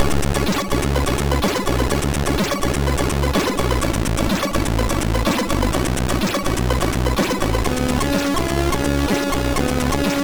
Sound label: Four Channels, sid MOS6581R2; The Dungeon Master